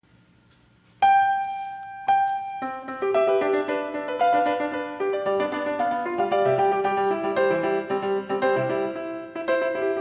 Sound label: disklavier